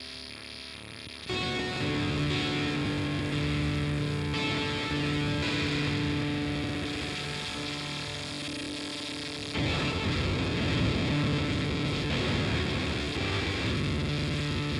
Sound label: Industrialectric 4046 m Bitcrusher without VCO